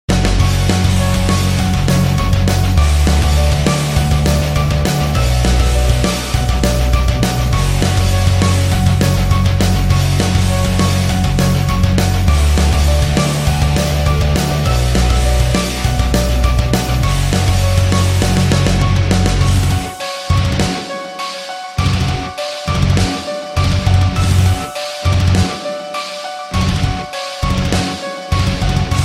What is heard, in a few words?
zombie theme; call of duty black ops